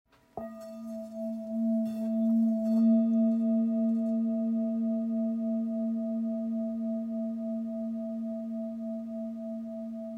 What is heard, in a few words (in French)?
Bol la diese 970g